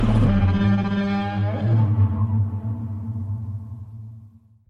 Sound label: godzilla roar; godzilla bellow